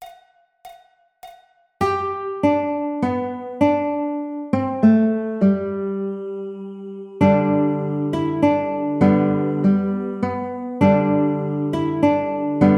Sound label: Silent Night Baritone, ukulele